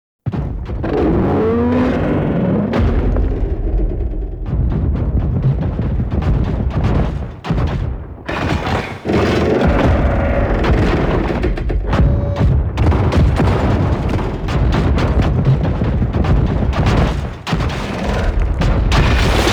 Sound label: Thumper Approach; Cinematics; Grineer Eidolon Shotgun